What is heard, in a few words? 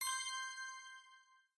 Ui Star